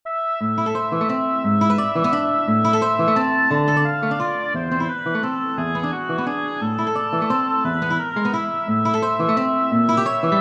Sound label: serenade for oboe guitar; burgma ller johann friedrich franz